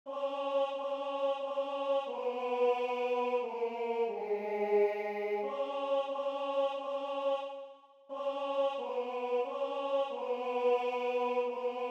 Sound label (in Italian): Tenore; Hai dato un cibo